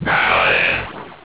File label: shrink; paladin